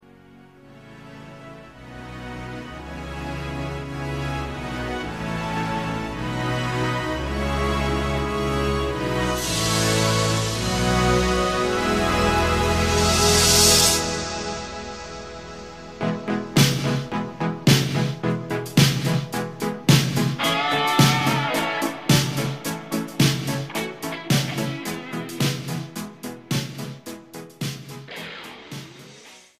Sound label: yamaha dx7